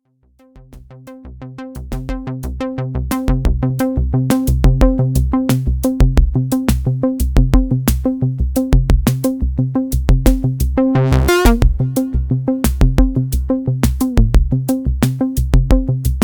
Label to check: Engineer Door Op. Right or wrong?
Wrong